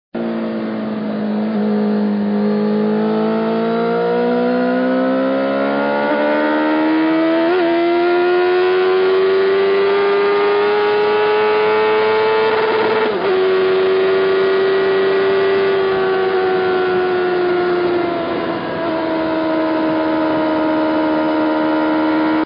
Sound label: Suzuki GSXR750